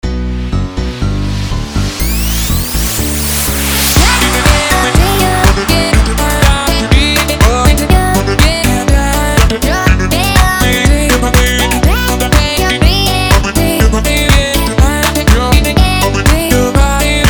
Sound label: chiptune house